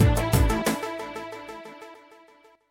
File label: beautiful success